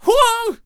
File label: Catapult Death Vocals